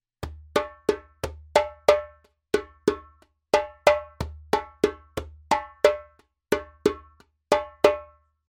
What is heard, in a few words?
djembe; djembebog oevelse